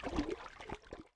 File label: Underwater - Swimming; RadwinIsland